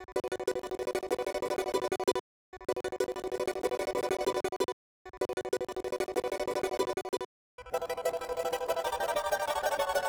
Charango, Hip Hop Exotica; 095 Bmin

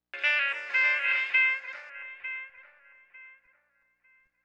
Stab; Thirty-One Flavors

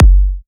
EDM Future Kick

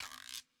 Guiro Hit; Hip Hop

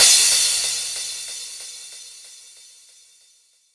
EDM Future Crash, Cymbals; Big EDM; Future House Tools

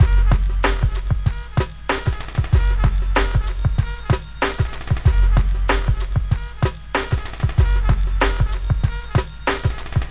Beat 095, Hip Hop

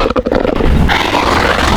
Puma; Cymatics; Serum; Animals for Serum - Gold Edition

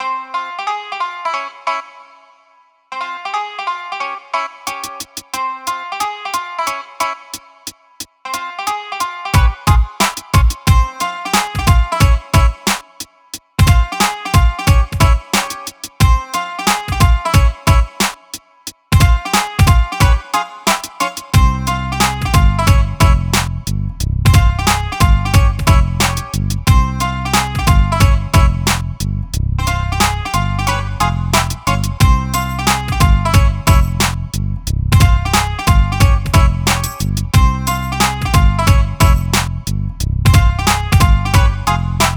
090 Cmin, Hip Hop Exotica

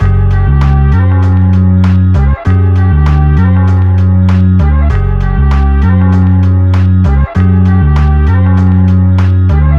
Hip Hop, 098 F#min; AppleLoops

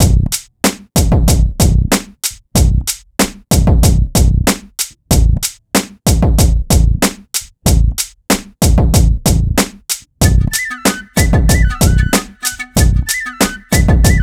094 Bmin, Hip Hop Exotica; Apple Loops; Big Fish Audio

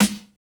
Snare Hit; Hip Hop Exotica; Apple Loops; 104 Cmin

